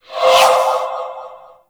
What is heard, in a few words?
Spirit Primary Fire Whizzby; Warframe; Grineer; Thumper Bot; Sentient Spirits